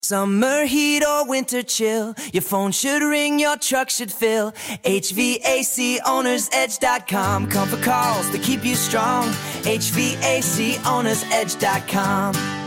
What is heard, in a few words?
jingle, podcast